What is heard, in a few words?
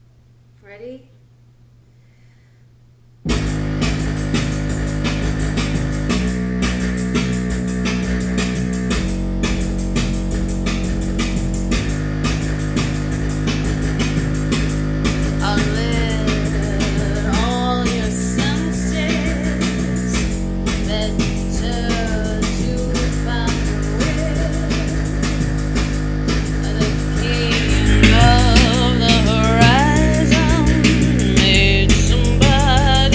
hammond in the garage tune